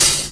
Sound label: dark tone open high hat d sharp key; Duration 0:01